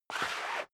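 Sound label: step dress boots concrete slide long, footsteps; schritte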